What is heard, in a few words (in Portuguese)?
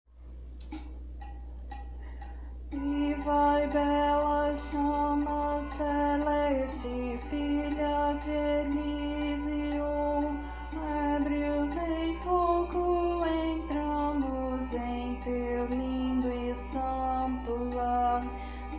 an die freud ode a alegria contralto